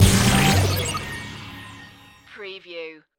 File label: Hit Digital; Production Element; Sound Effects Bible